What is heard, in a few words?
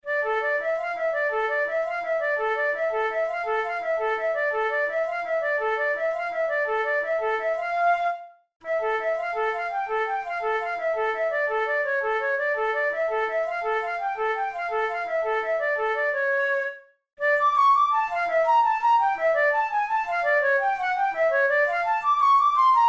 methode pour la flute study